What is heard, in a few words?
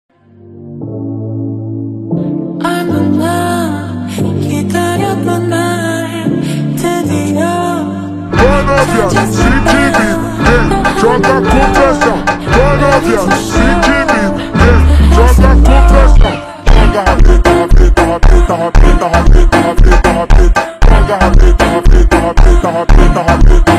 FUNK Slowed